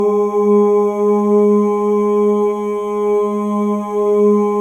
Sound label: MALE OOS, Symphony of Voices; Spectrasonics